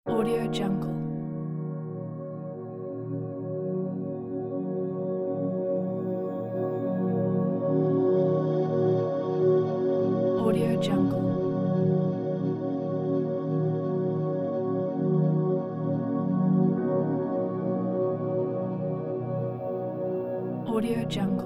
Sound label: meditation and relaxation